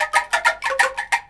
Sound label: ANGKLUNGS; Spectrasonics - Heart of Asia CD2